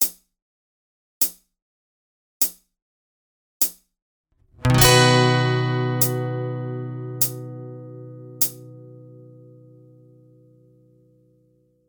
Whole Notes capo 4, strumming; Ultimate guide to strumming book

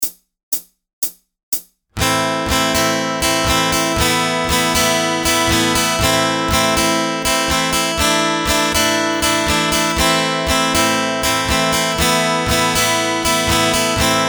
Embellishing Each Bar, strumming; Ultimate guide to strumming book

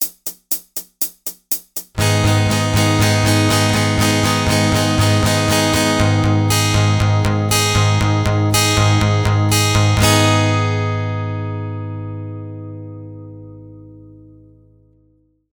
Bass-Treble Strumming, capo 4; Ultimate guide to strumming book

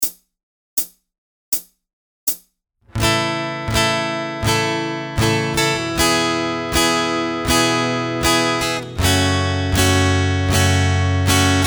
Go to Strumming Pattern capo 2; Ultimate guide to strumming book

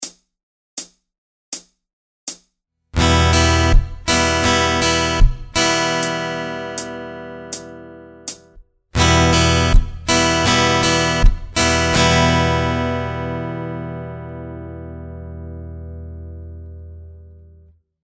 The Basic Backbeat Percussive Groove; Ultimate guide to strumming book

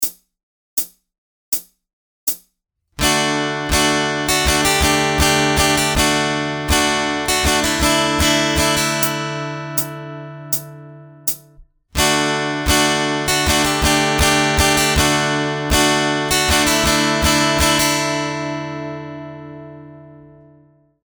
Embellishing the Modern Strumming Pattern; Ultimate guide to strumming book

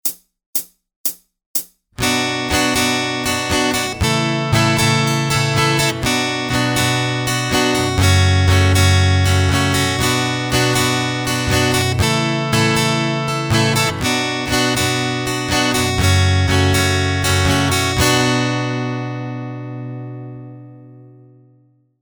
Strumming Pattern, capo 2; The Ultimate Strumming Pattern; Ultimate guide to strumming book

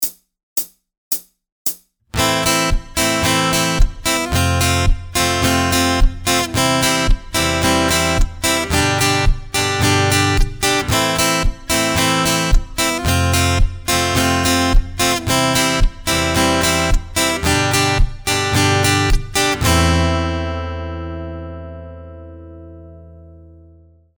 The Basic Backbeat Percussive Groove; Ultimate guide to strumming book

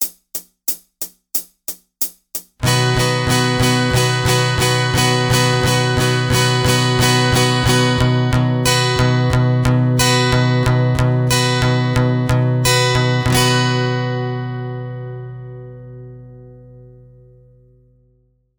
Bass Treble Strumming capo 4 SLOW; Ultimate guide to strumming book

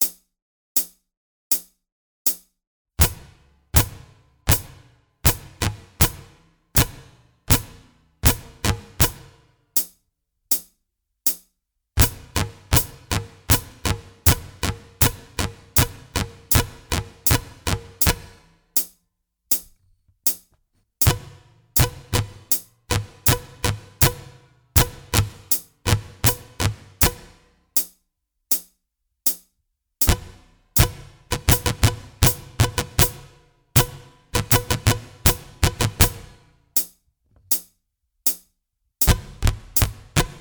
Strumming, capo 2, guitar; The Fake Drummers Strumming Game; Ultimate guide to strumming book

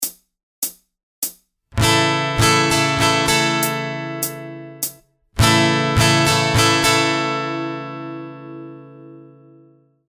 The 3/4 Waltz, capo 2, strumming, guitar; Ultimate guide to strumming book

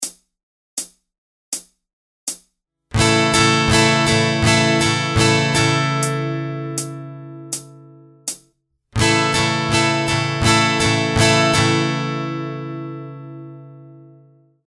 Constant Pulse Strumming Pattern capo 2; Ultimate guide to strumming book

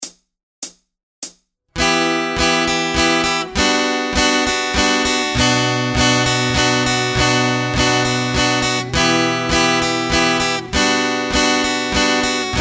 The 3/4 Waltz capo 2, strumming, guitar; Ultimate guide to strumming book

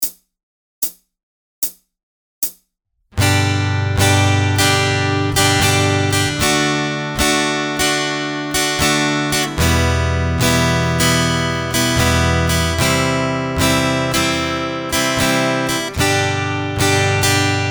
Swinging the Ultimate Strumming Pattern SLOW; Ultimate guide to strumming book

